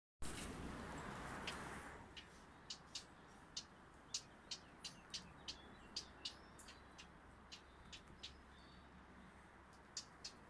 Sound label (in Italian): capinera